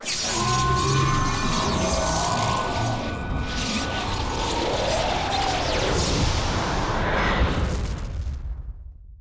phase; Cosmic Rage; The Experience-light

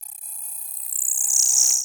Display; Cosmic Rage; ships; computer

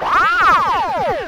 alarm; Cosmic Rage; The Experience-light; ships

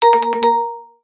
chat, messageboards; Cosmic Rage; The Experience-light

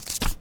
buffer switch, notify; NovaSounds; NovaRealm

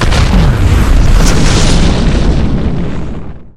rocket, vehicles; combat; Cosmic Rage

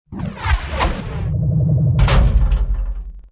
Salvage, salvaging; Cosmic Rage; The Experience-light; ships

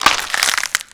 break; Cosmic Rage; combat

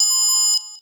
beep; Cosmic Rage; nebula

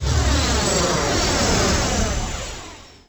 tank undock, ships; Cosmic Rage; The Experience-light

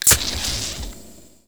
inject; Cosmic Rage

